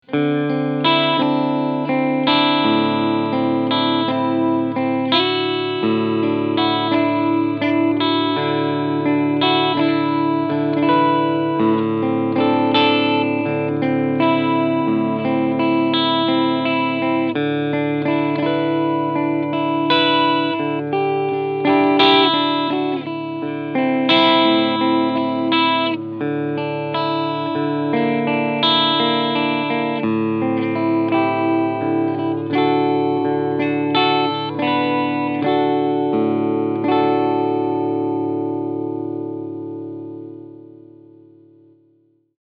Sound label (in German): FGN Expert Flame E Gitarre Cleansound beide PUs